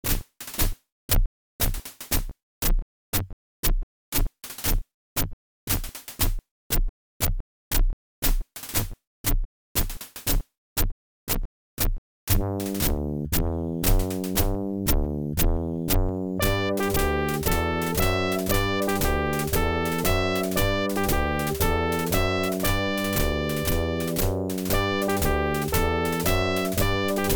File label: March; Victory